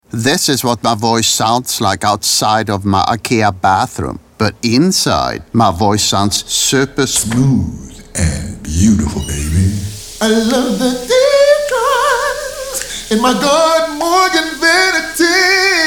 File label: IKEA singing radio; ikea bathroom event